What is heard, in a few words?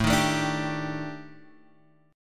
D sharp Major 7th Flat 5th, A, guitar chord